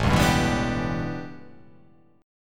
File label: A 11th down, guitar chordshape; strummed